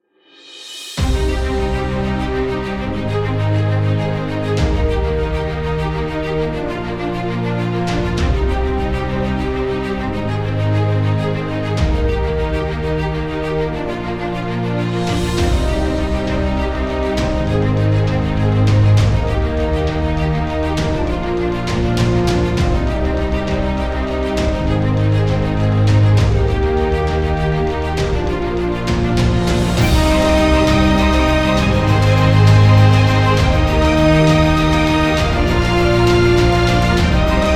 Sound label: Epic Inspiring; True Blades